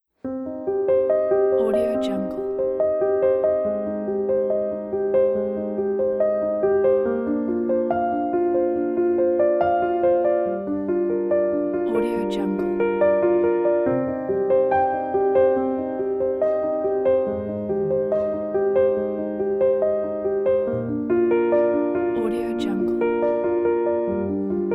magic piano

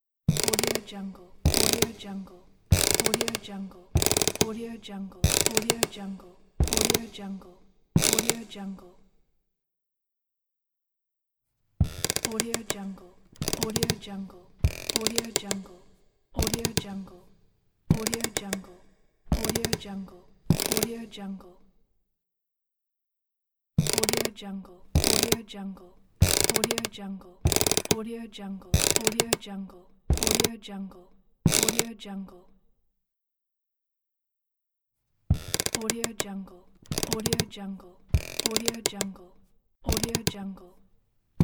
footsteps on creaking wooden floor

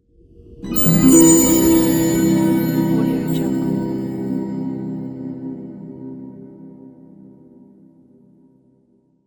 magic; volkovsound

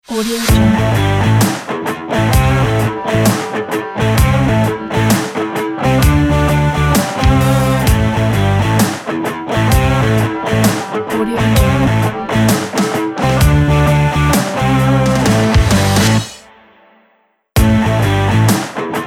rock guitar logo

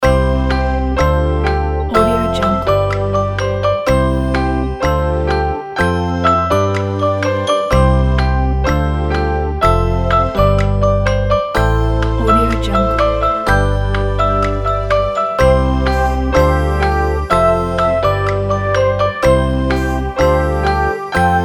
game show waiting timer

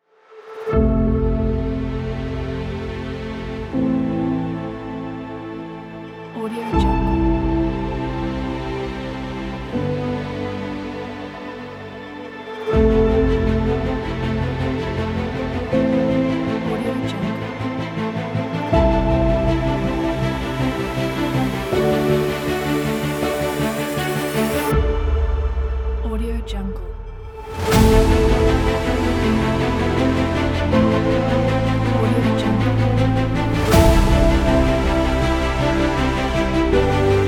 for cinematic trailer

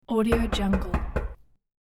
heavy knocks on glass and metal door; hollywoodedge

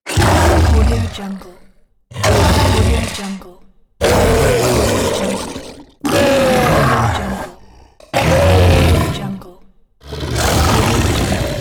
beast roar

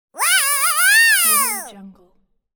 cartoon voice falling; applehillstudios

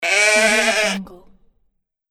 sheep

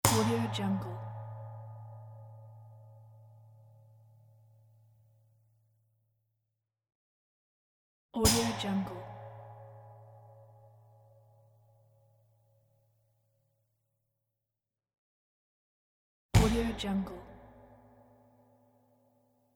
metal fan grill hit

